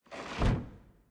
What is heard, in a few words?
Basement Door Close